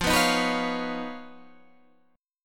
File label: Eb 7th Suspended 2nd Sharp 5th F